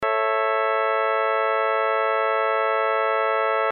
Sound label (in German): a cis e rein